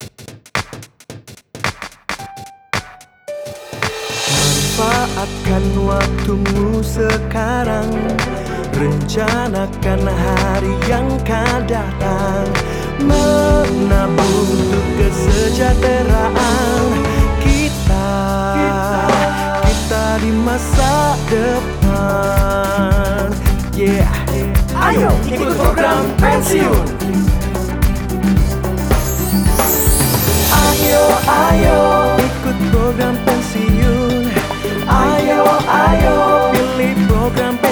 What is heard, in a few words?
Jingle